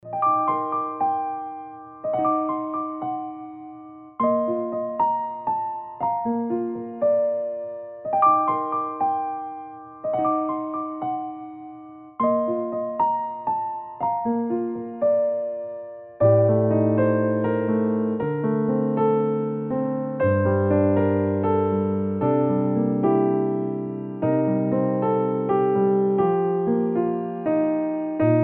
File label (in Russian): nezhnye zvuki pianino